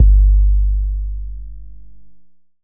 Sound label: KICK, DRUM HITS; Loopmasters; The New Sound Of Dubstep